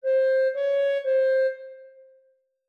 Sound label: 5 notes F min